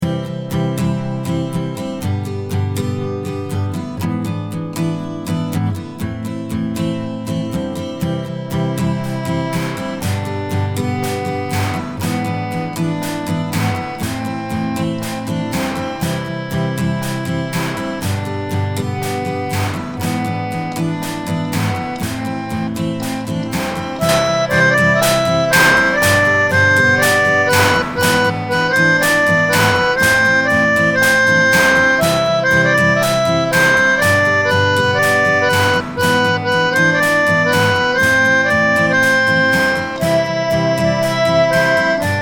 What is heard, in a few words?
auto melodica